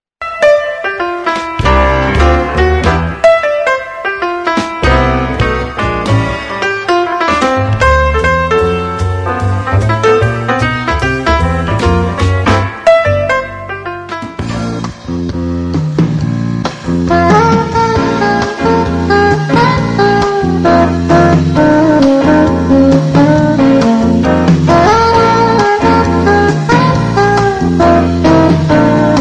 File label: light Jazz